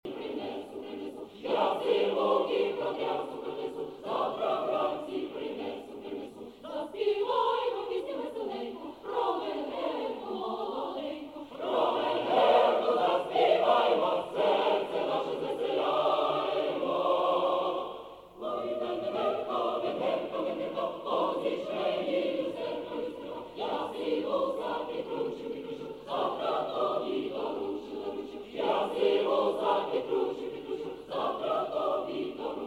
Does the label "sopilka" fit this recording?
No